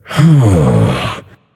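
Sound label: non verbal thinking, voice; cernunnos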